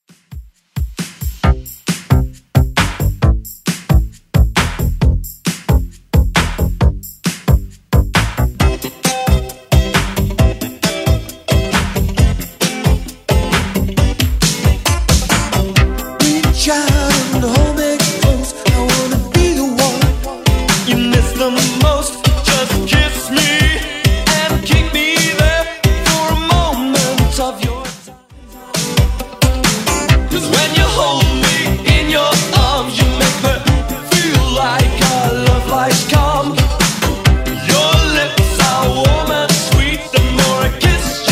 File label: 80s 134 bpm; Redrum